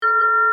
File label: alarm, computer